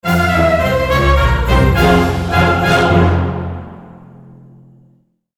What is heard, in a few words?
game end; greevil